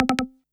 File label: Notifications and Buttons